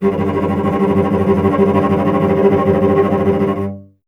STR VC G 2, Cello; Miroslav Vitous; Solo Instruments VOL-1

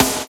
SNR EKO, Snares; Roland - Rhythm Section; Snares 5

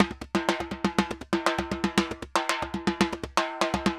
TIMBA, SAMBA; Best Service ProSamples vol.14; AKAI

